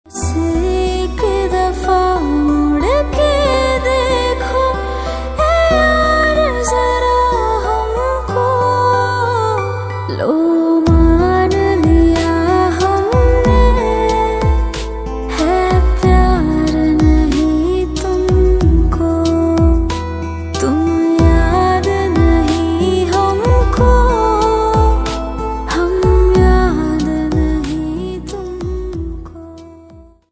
Female hindi ringtone

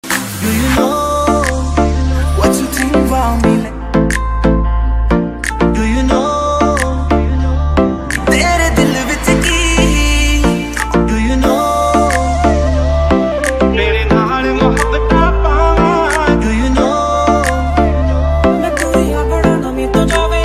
panjabi ringtone

